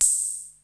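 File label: TR66 open hi-hat; Crypton - DrumBase5000; TR-66 #2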